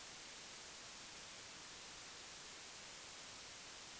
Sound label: Noise